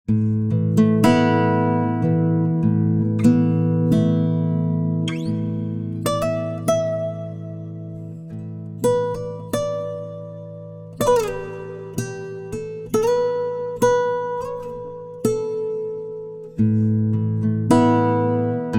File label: Guitar Quantum, Time-Stretching